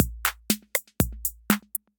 Kit 808; Drum Machines; Effected Drum Kits; Ableton; Factory Packs

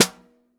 SN1 Bottom, Snare; Ableton Foundation FreePacks; Snare Designer

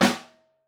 SN Room, Snare; Ableton Foundation FreePacks; Snare Designer

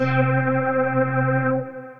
Flash Organ, Synth Keys

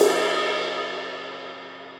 RIDE 22 edge crash

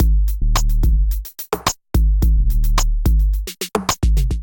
Onyx Kit groove 108 bpm, Drum Essentials; MIDI Clips; Ableton

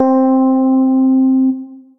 Electric Piano Daze; Piano & Keys; Ableton; Factory Packs; Beat Tools

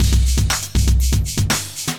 Acoustified Kit, Drums

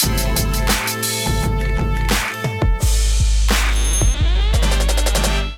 Kit Melodic 86 bpm, Drums; MIDI Clips; Full Lotus; Ableton; Chop and Swing

